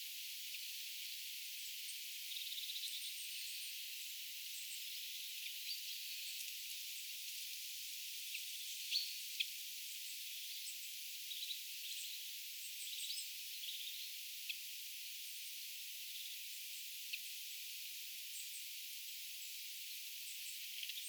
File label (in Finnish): tuollaisia pieniä järripeipon äänia yksi peipon djyp, kuvien järripeipon pieniä äännähdyksiä